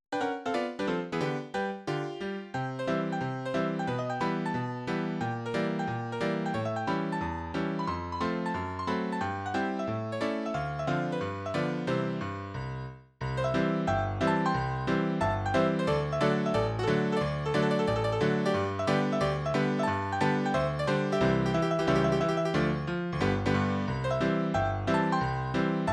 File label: Overworld Piano; Super Mario Bros. 2